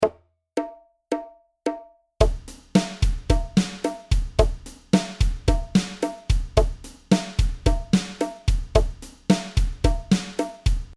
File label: Groove